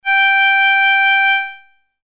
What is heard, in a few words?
Harmonica